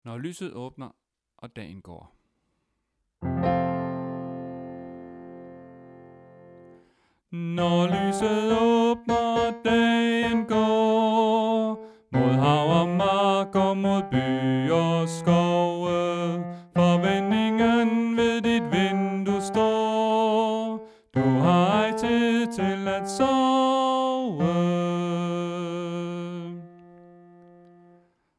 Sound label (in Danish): Bas